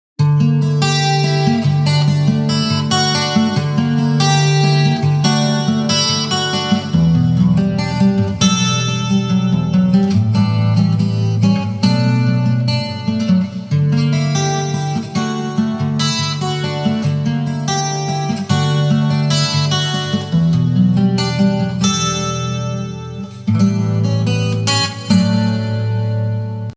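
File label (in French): guitar, guitare acoustique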